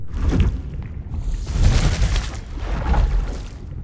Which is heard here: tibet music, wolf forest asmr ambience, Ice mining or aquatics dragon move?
aquatics dragon move